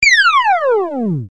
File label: down